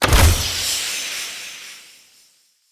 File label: Bow Ranged Shot Arrow Rain; Weapons; Player; Bows; Grand Father Bow